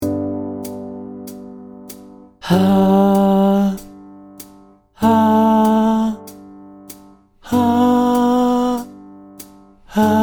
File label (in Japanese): 男 息漏れのある地声